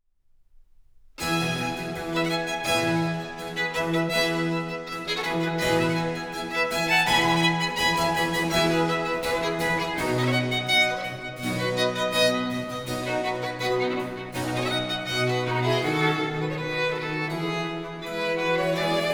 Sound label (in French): Pièces de violes, Sonata in F Major; Book 3, No. 7-9; Nicola Matteis; Ayres for the Violin; Quatrième Livre (Paris 1717)